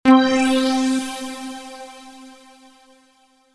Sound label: Power Up